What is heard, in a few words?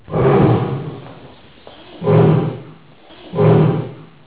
leopard